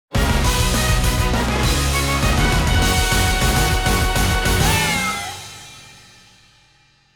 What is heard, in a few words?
Victory Mix; Feline Officer Scroll; Nobunyaga's Ambition; Warriors All-Stars; PS Vita